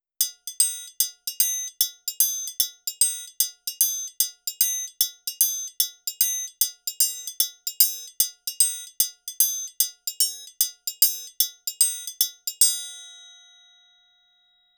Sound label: triangulo low single hits; brazilian series; muletone audio